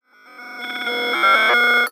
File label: Vox; The Weird Side Samples; 99Sounds